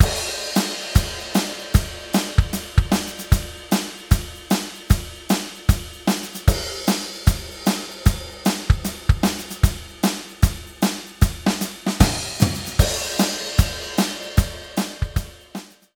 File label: drums proc